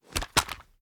reload drum empty end; viper

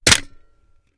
sm reload fast magin; gmod

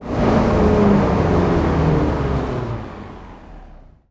throttle off, vehicles; audir8

